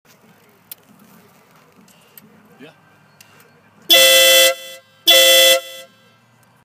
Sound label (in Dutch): Claxon, BMW K1300S Stebel Nautilus